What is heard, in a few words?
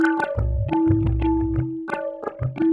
TABLAS; Zero-G; Chemical Beats